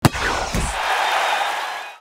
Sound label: Football email alert; Nokia; audio themes